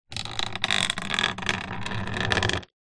household lamp wall mounted metal bendy arm movement bend creak; zapsplat